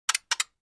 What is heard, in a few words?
Flash Gear Two Low Pit, flash gear